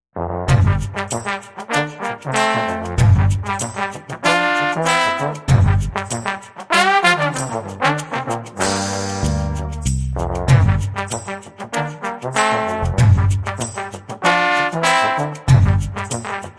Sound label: Bavarian Funk No Main Beat